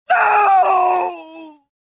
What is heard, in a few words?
death, prisoner